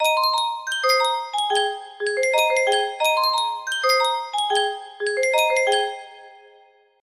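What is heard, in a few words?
small jingle, music box melody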